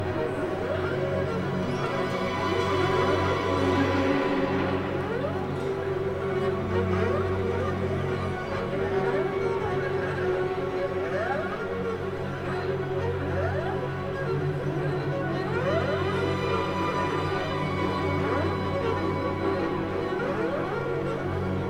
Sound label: Pyramid Strings